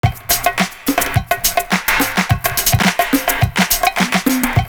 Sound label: DISCO TRIBE, LOOP; Univers Sons